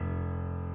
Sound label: key